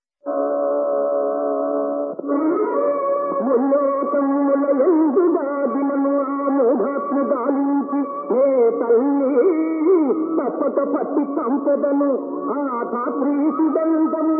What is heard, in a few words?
Padyam; RenukadeviMahatmyam(1960)